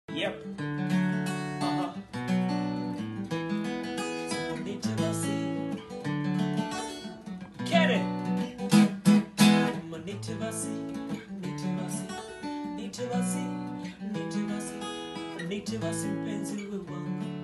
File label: Kenny Guitar